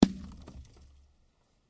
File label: snowball glass; Throwing Snowballs Kit